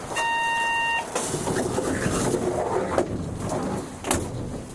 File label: streetcar door; tetsuon